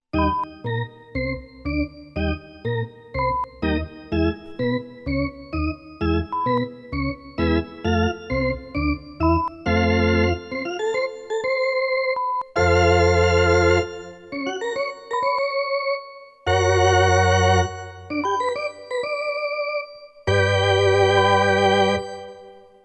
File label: baseball Music